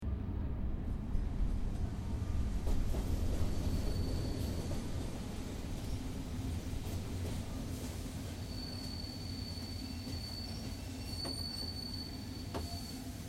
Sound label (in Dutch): vroege vogels nationaal park de mijnweg